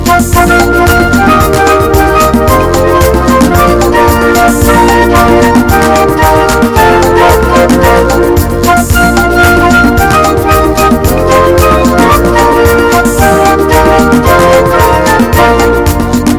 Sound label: Great Dancing Song